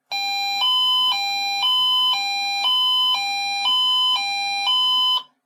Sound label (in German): Funkweckerschleife; Königsbrunn